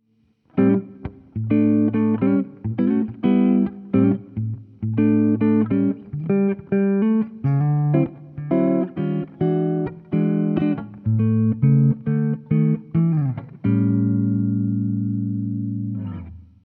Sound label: V30 Jazzy